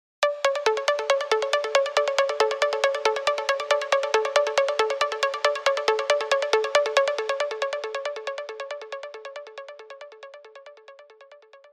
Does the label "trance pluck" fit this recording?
Yes